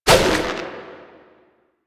Water Balloon Fire; Warframe; Water Balloons

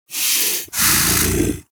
Predasite Vocal Breathe; Warframe; Hunting Critters

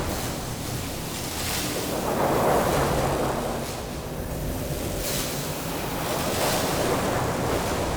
Energy Spike Border Loop; Powers

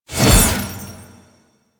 Sword Attack Whoosh; Weapons; Melee; Warframe; Orokin Weave Sword; Weave Boss

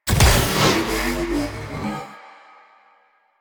Bullet Jump, Motorcycle; Motorcycle Ninety Nine

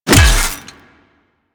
Melee Hammer Impact Robotic Flesh; Warframe; Weapons; Hammers

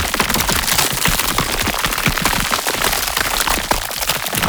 Murmur Portal Shatter Closer Loop; Warframe; Ambience; Duviri

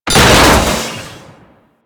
Storage Container Cylinder Smash; Objects; Warframe

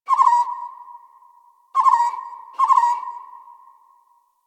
Night Tree Critters; Ambience; One Offs; Warframe; Eidolon Plains

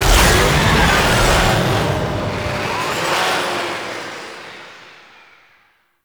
Corpus Ship Fighter Thruster Lift Off Long; Ambience; Props; Warframe; Corpus Ship Remaster

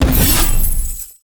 Shared Fate Chain Stop; Warframe; Warframes; Pax Duviricus

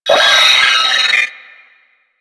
Sentient Brachiolyst Death; Enemies; Barks

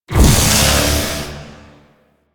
Betrayal Beam; Enemies; DE; Warframe; Warframes; Pax Duviricus

